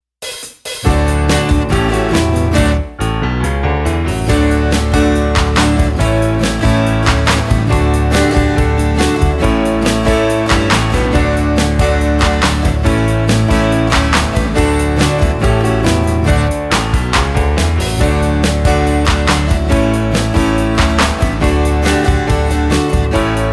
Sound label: Clap Alleluia Instrumental; KidsLiturgy; Year A